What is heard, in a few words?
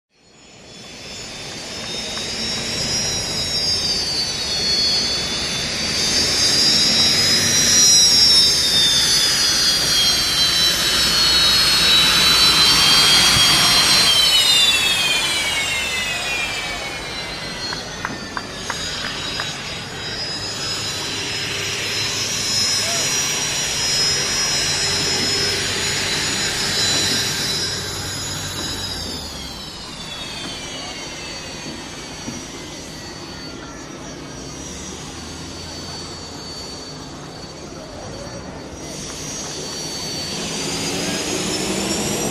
Hydroplane Dock Amb